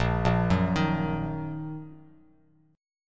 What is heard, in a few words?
Bb Suspended 4th Sharp 5th Bb down Bass Standard; arpeggiated